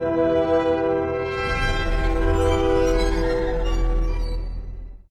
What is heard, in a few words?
player join, notifications; fisk city